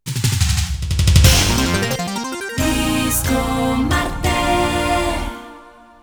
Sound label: Disco